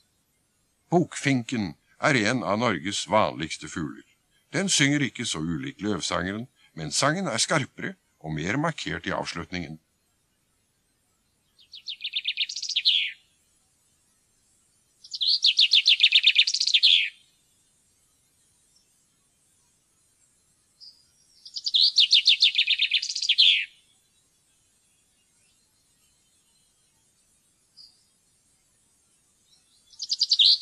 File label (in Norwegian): bokfink